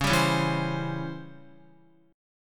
Db Minor Major 7th Flat 5th Db Guitar, chord; DbmM7b5 strummed; JGuitar

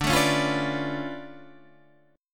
D Minor Major 13th

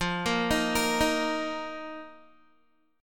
F 7th Suspended 4th F down Guitar; arpeggiated

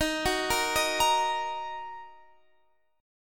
Eb Minor Eb down; arpeggiated

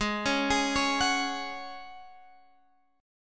F sharp Suspended 2nd, G sharp, guitar chord

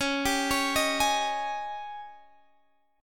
Ab Major 7th Suspended 4th Db

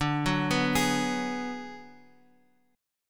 D 6th Flat 5th, Guitar, Open F; D6b5 arpeggiated; JGuitar

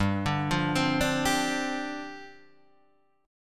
G 7th Suspended 4th G down Guitar Half Step Down

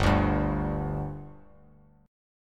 G 5th G, chord; G5 strummed

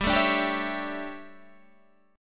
D Major 7th Suspended 2nd Suspended 4th

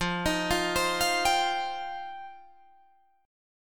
F 7th Suspended 2nd F down Guitar Drop D

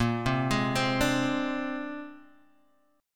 C 7th Suspended 2nd Sharp 5th, A sharp

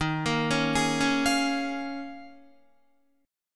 Eb 9th Suspended 4th Eb, Guitar; arpeggiated; chord

